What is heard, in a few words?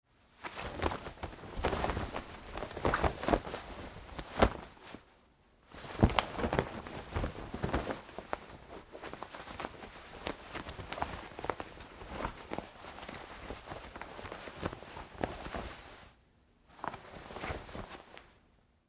Foley Fight Moves Jeans Struggling; Wrestling and Struggling